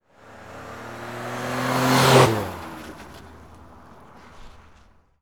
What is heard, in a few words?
cars; vehicles